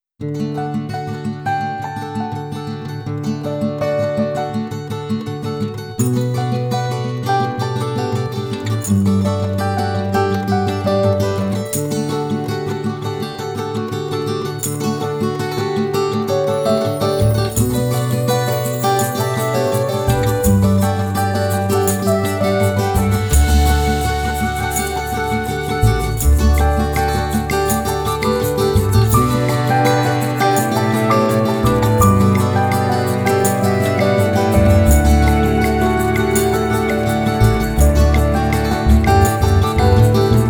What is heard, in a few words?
Country sad ballad; Jean Christophe Quilez